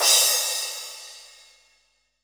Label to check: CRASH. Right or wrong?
Right